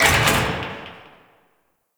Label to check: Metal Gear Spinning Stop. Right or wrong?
Right